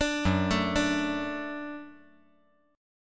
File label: F sharp Minor Sharp 5th, F sharp, chord; arpeggiated; 7,6,5,5; 5G,4C,5E,5A